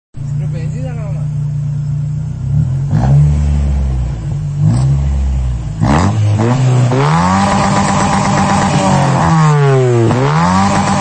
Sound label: b520 exhaust